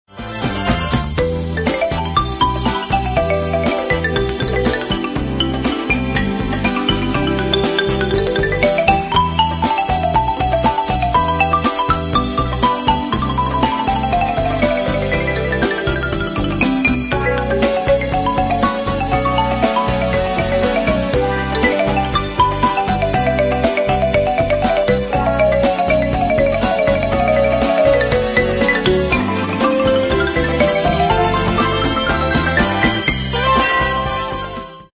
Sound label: moonlight, mallets